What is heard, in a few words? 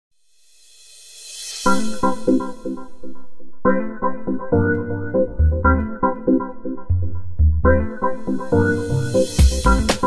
groove